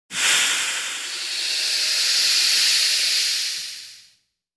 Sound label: Synthesized Whoosh; sweetener